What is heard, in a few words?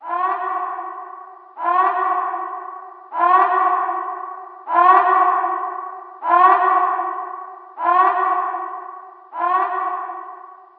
manhack alert pass, alarms; ambient